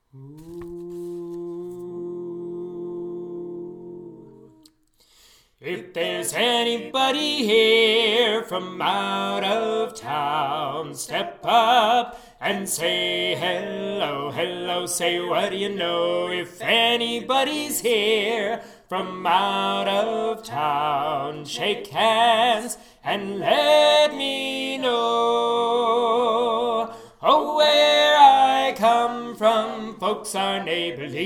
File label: Lead